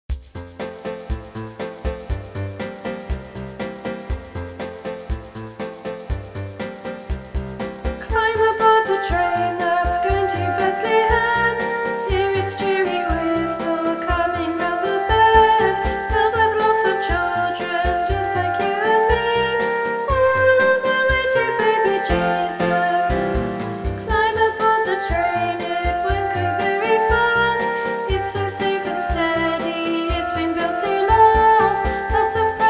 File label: Vocal; mayors christmas carol music